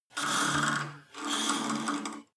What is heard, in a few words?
cable machine old pull release fast; sport freizeit